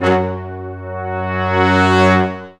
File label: BRS, Lrg Ensemble; Roland L-CD702